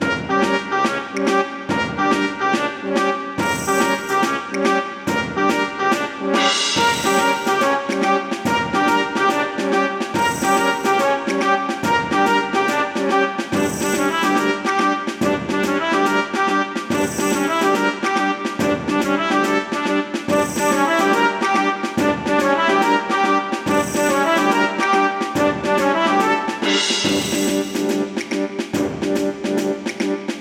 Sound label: spooky march